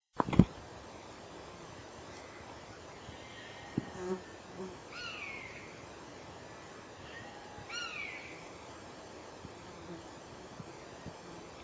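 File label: anger, honey buzzard; hexhamshire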